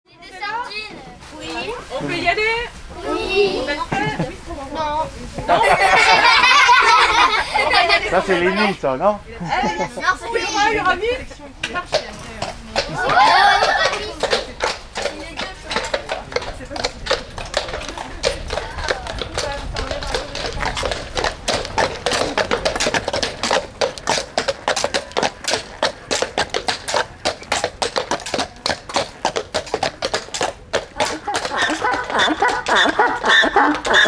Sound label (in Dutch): trekpaard; NORD